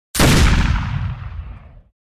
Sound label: AWM Sniper rifle single shot; Sniper Rifle Sounds